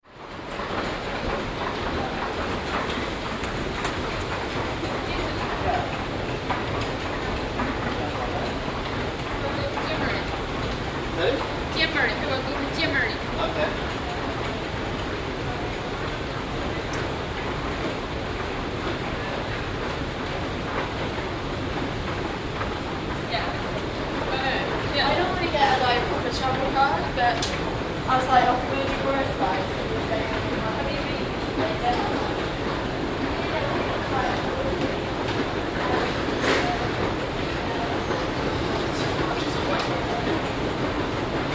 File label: Northern line escalator; Moorgate